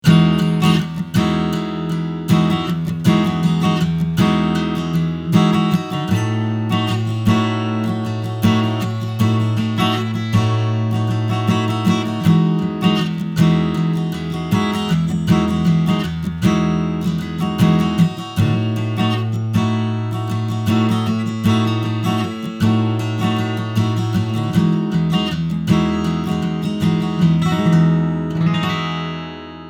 Framus Legacy Series FD 14 SV Strumming Pattern

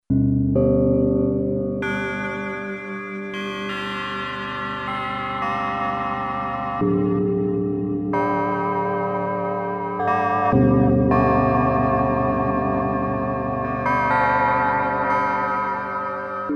FM Wavetable Osc Oberheim SEM Filter

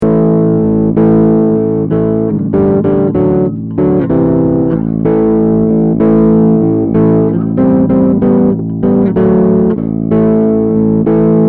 Bass TightDrive Chords; Amptweaker

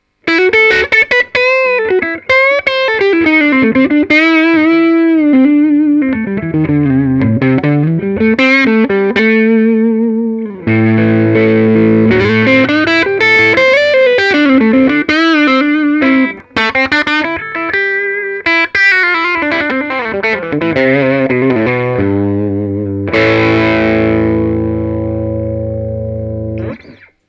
FW15R Crunch

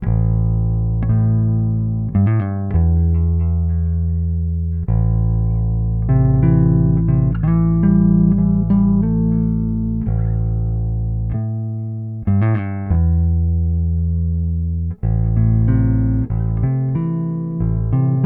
Ballad jam

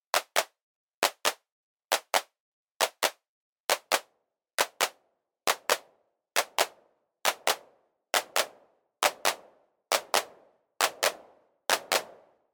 Roland TR 09 Clap

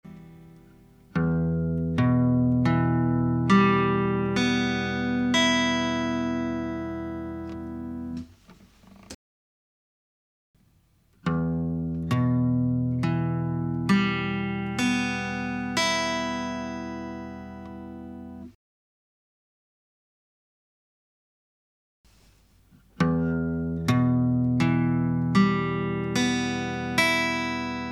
Guitar; Neve 543

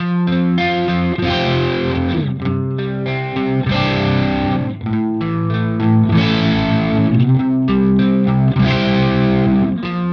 Fender both crunch

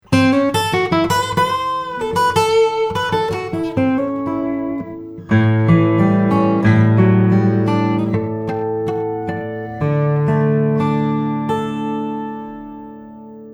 Git Chorus

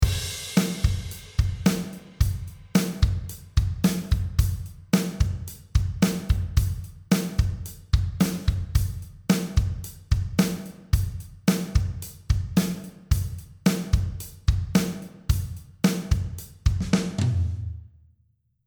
altiverb 8 xl drums great hall; audio ease

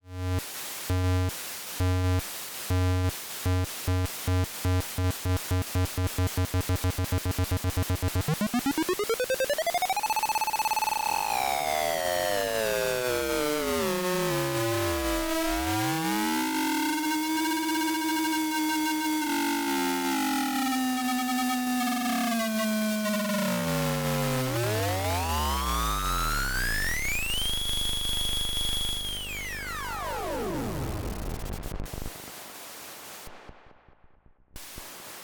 dreadbox Erebus V3 Analog Switcher

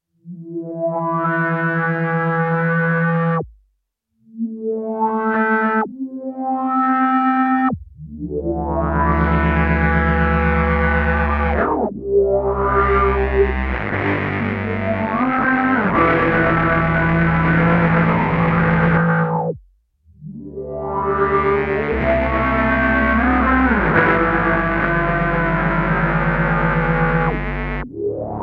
Synth Sinus Long Attack

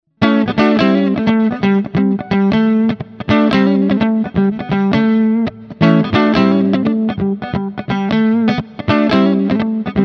Framus Diablo Pro Neck Humbucker clean

